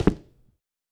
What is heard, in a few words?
Dress shoe